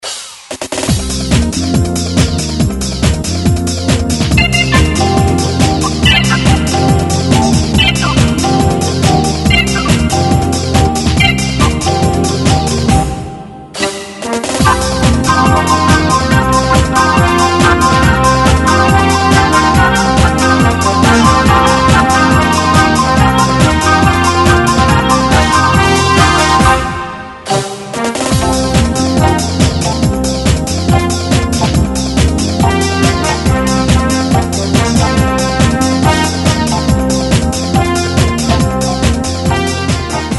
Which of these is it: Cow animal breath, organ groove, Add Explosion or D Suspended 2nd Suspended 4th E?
organ groove